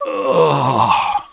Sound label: sniped, male